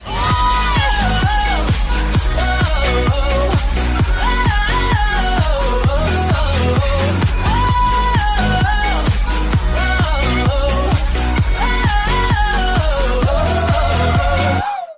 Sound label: whoop